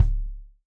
Kick Felt Soft Dry; One Shots; Drums; Session

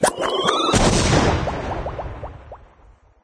drink volcano; There; ThereClient

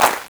Clap Super Layer, One Shots Drums; Ableton Live 12 Lite; Core Library

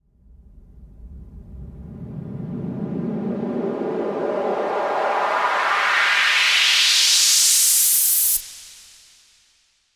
Riser Whoosh Up

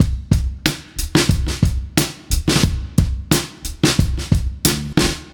Break Ghosts 90 bpm, drums; Loops; Ableton Live 12 Lite; Core Library

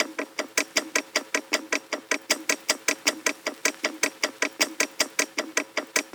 78 bpm, Drums; Loops; Top; Turn Signal; Ableton Live 12 Lite; Core Library

